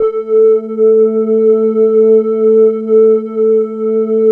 SYN JX; Best Service Gigapack 1

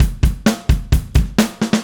Drums Fill, Indie Rock; Sonic Foundry (Sony Creative Software); Indie Rock Anthems; Session 10 - Roswell

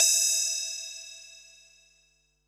HH, Pearl SC-40 Hihats and cymbals

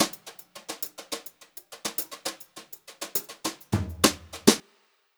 FILL, Brushed Drum Loops

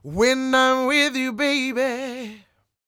CROON, Vocal Blues & Soul MELLOW

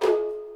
INDO PERC; Crypton - DrumBase5000

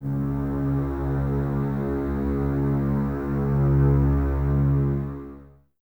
BSS C#2, String Ensembles, Basses, f Full; Miroslav Vitous; 90 s Sample CDs

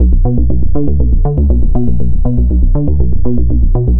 Moody Bass, Bass Foundations; Sonic Foundry (Sony Creative Software); The Electronic Music Manuscript Collection

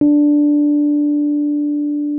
Rhodes MK1 D4; Xsample VOL-1

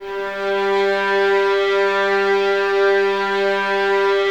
violins G 3, string ensembles; Miroslav Vitous; 11 Violins

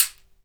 CAXIXI; ILIO; Synclavier Percussion 2; World & Orchestral

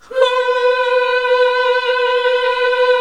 HMM C3, CLASSIC VOX8

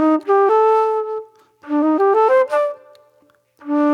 Movie Flute

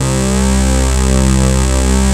JP 8 SYNS; Pro-Rec - Synthsonic; JV ANALOG2

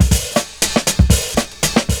CYMB, Breakbeats; USB Soundscan vol.46; AKAI

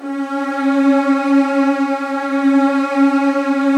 C#1, Virtuoso Strings

